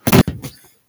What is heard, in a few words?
impact sound